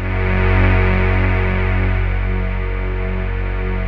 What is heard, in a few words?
NOIZ SYN; Masterbits; Vintage Voltage; SEQ-FX